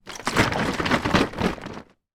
food drink milk shake in plastic cartoon

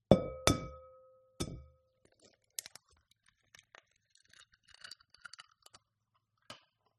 eggs crack bowl

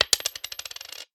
money coin drop; sound effects one

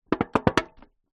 foley tin metal lid flex movement; zapsplat

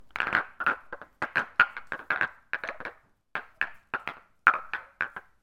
sport snooker balls handing multiple

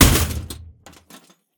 Impacts; Just Impacts; Extension I; soundbits

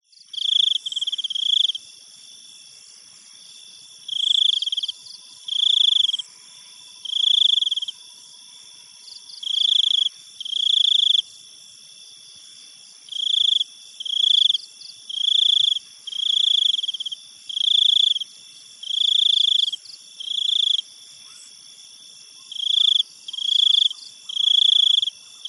thailand jungle night insects close up and dist

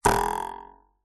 cartoon ruler twang metal

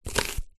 knife slice onion

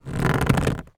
foley plastic grip hand hard movements creaks; zapsplat

